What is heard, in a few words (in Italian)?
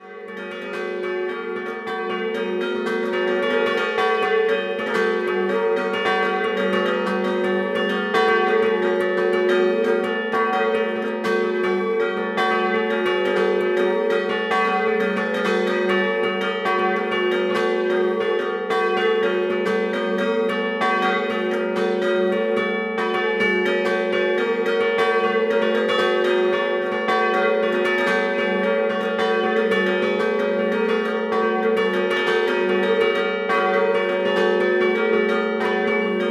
PIEVE DI ROSA, campane